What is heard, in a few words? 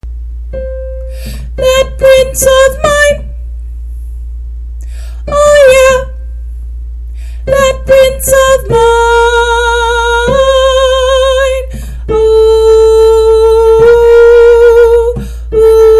soprano